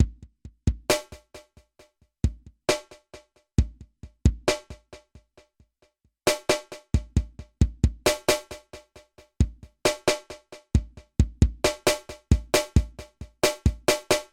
Dub Delay; bosonHavoc

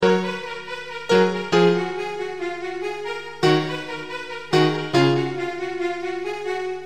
Garage Synth; McTm; Mc Thrustmaster

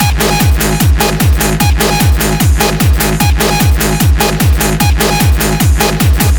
hard style loop; hardsamples

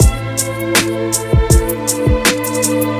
strings beat; logicfly

